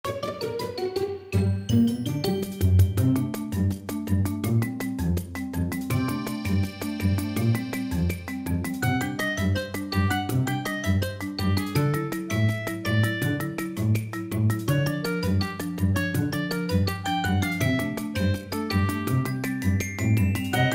Forest Overworld; SMBW

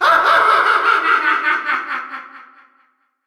Laugh; Crazy Hand; Brawl

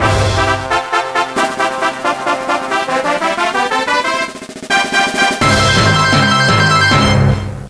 Casino Lucky Win; Dragon Quest Series

